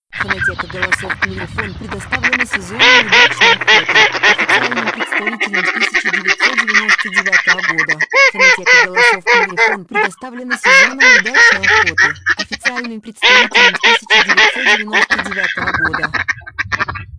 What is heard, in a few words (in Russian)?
кряква; plurifon